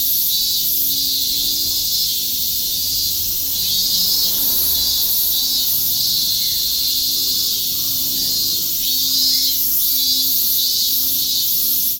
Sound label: xy cicadas